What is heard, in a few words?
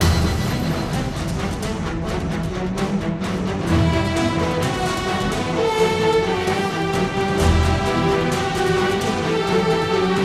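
Music Swarmer Fight Loop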